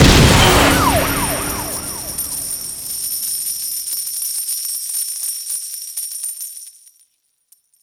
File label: hotline big win start; netent